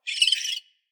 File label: sturnus cineraceus cry